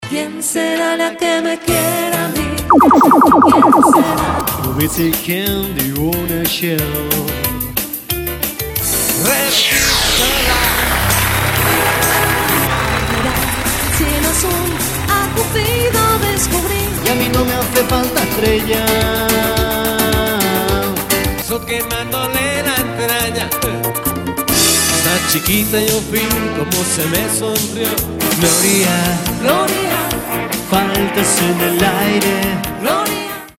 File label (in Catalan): entrada orgue de gats